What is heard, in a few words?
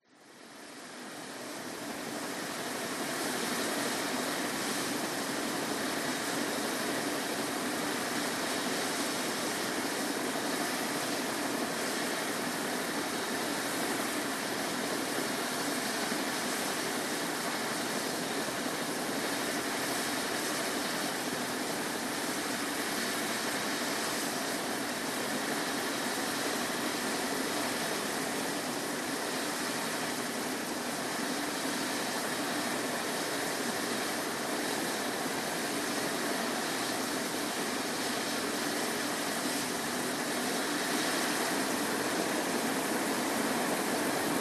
helicopter idle hover take off away eurocopter as350 b2 squirrel